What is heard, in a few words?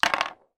sport wooden handled skipping rope put down on table